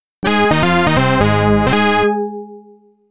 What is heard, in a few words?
Level Complete, IBM-PC AdLib; Supaplex